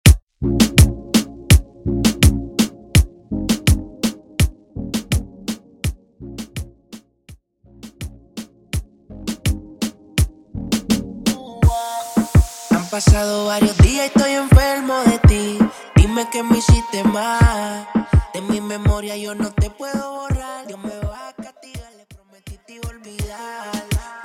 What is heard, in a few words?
Reggaeton Percapella Intro Outro 83 BPM